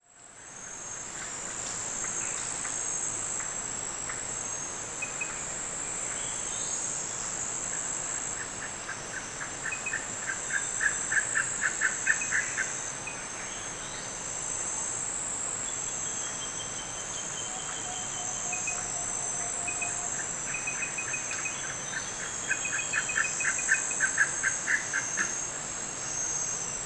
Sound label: Enjoy this soothing calm calling of birds in the Ankasa rainforest with calm water rushing in the bac; Ghana; Ankasa Afternoon Calm Rainforest; Wind Is the Original Radio